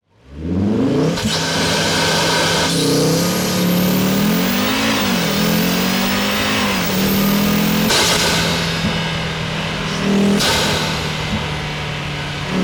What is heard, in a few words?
Launch Control, 2.5 TFSI EVO Open Air Intake; Ansaugung